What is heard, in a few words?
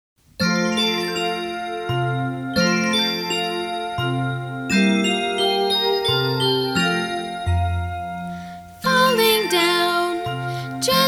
falling snow words and music